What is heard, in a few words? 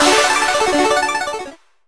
win Free Spins; reel rush